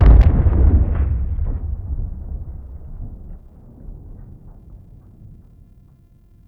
Rock Fall Distant; Warframe; Cinematics; The New War; Lua War Room

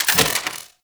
Sword Shield Unequip; Warframe; Weapons; Archwing; Melee

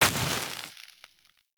Enemy Burn Death

